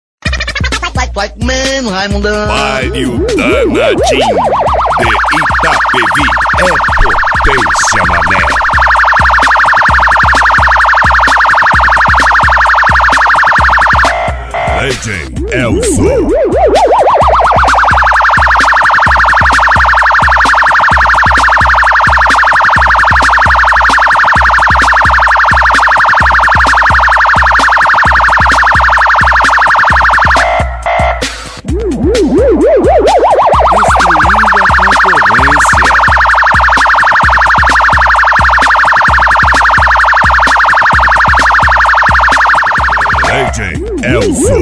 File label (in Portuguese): sirene; palio danadim de itapevi